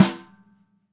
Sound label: Ludwig Black Beauty Snare mp Room; Sonic Foundry; Sony Creative Software; Vital Drums; 1969; Snare One-Shots